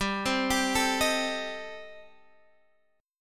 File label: C Minor 6th G, chord